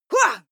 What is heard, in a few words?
female fight attack shout short; schreie; menschen